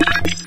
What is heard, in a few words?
portal enter, portalgun; Source Engine; Portal 2